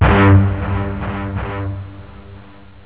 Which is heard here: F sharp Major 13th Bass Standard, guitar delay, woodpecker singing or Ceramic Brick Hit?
guitar delay